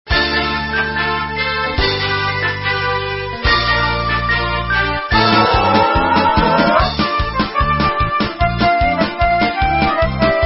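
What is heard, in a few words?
Instrumental, Israeli Dances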